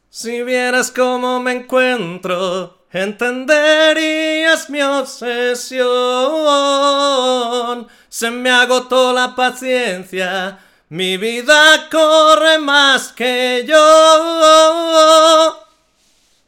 Twang oral male

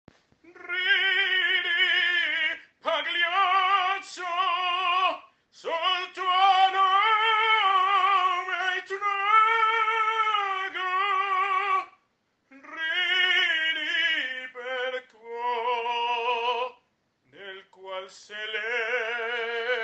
song male

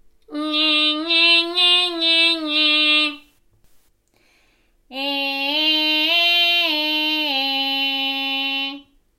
Twang nasal

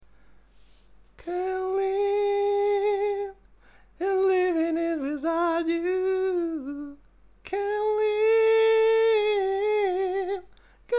Crying male

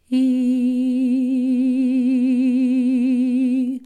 Vibrato mix

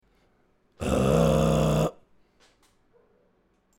Growl male

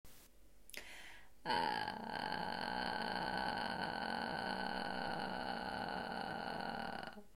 Cracking

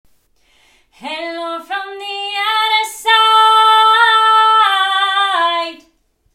Slime

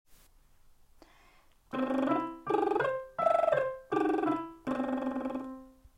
liptrill staccato; Hilito; Avanzado

